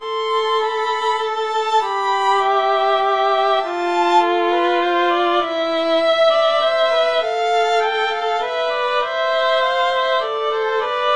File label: minuet in g minor violin duet